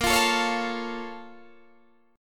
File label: Bb Minor Major 7th, Guitar, Open D; 20,20,19,19,20,19